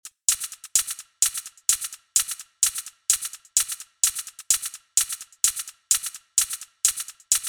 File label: good hat shaker